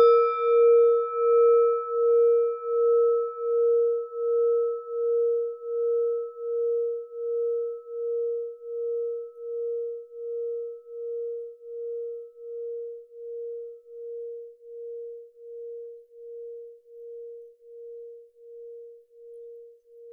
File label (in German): klangschale nepal, Klangschalen Nepal